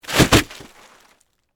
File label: Horror, Body, Fall, On, Plastic, Tarp; Blood and Guts; BlastWave